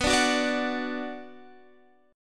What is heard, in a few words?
B Minor B Guitar Standard; strummed; JGuitar